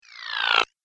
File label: High Speed Clicky Swoosh In Reverse; Whoosh Sound Effects; Andrew Fleming